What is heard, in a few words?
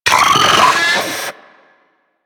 Arachnoid Vocal Leap; Enemies; Vocals; Warframe; Corpus; Arachnoid Hunger